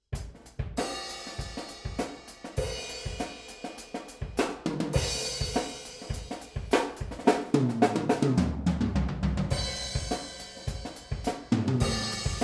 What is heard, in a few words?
Spaced Omni drums